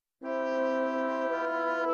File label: bassoons